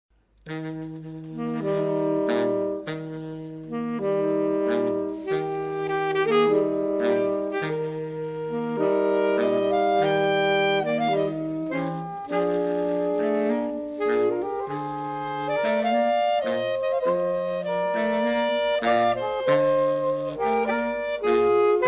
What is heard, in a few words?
tango andaluz